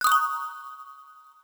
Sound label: item get